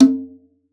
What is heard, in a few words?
Conga, Session Instruments